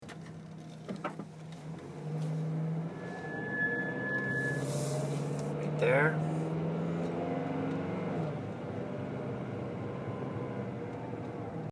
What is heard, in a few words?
noise when turning rdx; 1g rdx